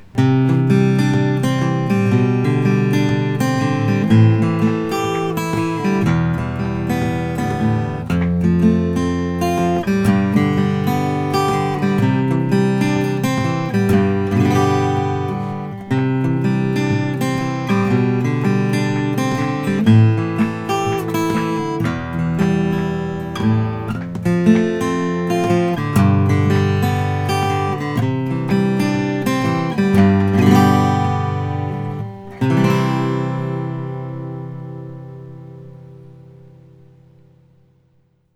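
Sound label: Flammang GC30 12 fret acoustic guitar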